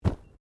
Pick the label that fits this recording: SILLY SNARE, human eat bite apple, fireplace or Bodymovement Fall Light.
Bodymovement Fall Light